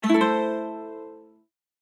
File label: Medieval bard mandolin strum